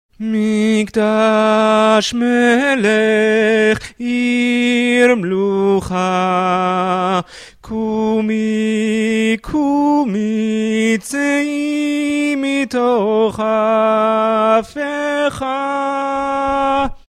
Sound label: lecha dodi tenor; choir; L´kha Dodi